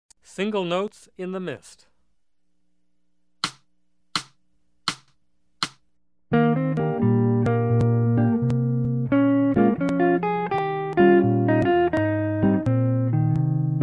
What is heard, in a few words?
Jazz